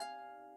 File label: harp